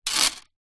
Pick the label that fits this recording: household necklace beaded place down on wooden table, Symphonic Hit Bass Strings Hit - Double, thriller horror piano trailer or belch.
household necklace beaded place down on wooden table